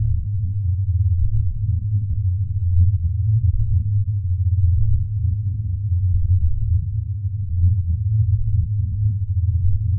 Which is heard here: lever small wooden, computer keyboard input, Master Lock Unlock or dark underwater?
dark underwater